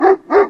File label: wolf growl; Cosmic Rage